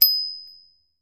Bell Chime High Meta, doorbells

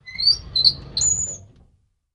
Drawer Metal Open

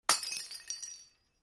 Teacup Dropped Cemen; clay & ceramic

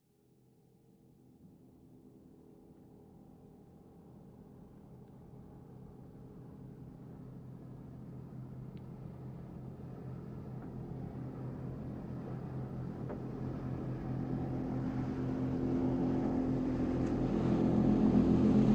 Military PBR Offshor

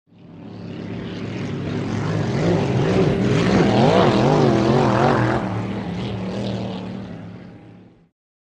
In Outboard Boat By, motorboats

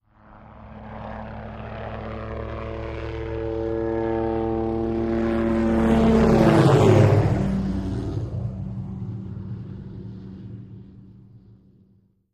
OH6 Loach By Fast Ster; little bird

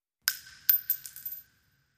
Shell Case Sngle Tube, shell casing movement

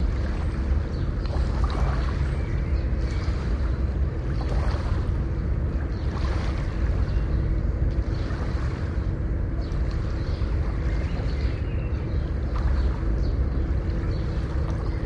Harbor BG Water Lap

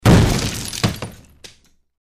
Small Impact Medium E, metal & glass

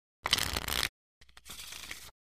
Cards Shuffle Deck; casino games

